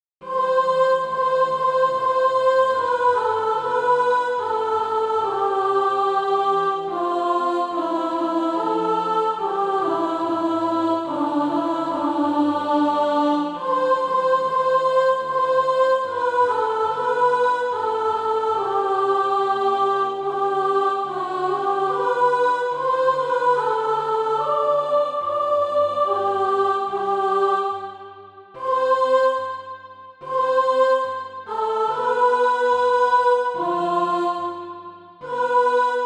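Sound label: soprano, Practise Music; Dare to Do Right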